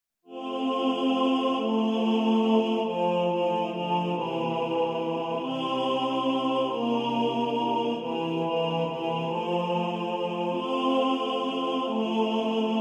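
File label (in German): Tenor2 im Vordergrund, Männerchor; Hudri schöane Marie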